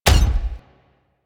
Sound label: Step Small C; Warframe; Corpus; Jackal Redux